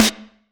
DnB Snare; drumnbass 170bpm; Drum Kits; musicradar; Drum Hits B

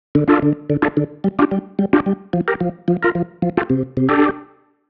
Keys Line 110 D, 110bpm, hiphop; musicradar

